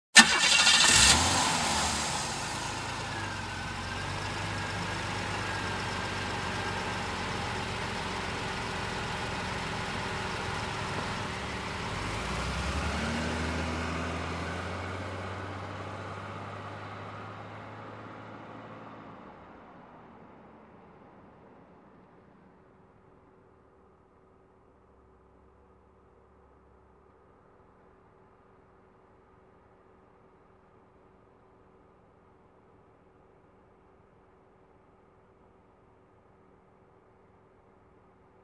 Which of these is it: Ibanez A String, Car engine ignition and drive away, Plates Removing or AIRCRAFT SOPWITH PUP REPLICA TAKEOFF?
Car engine ignition and drive away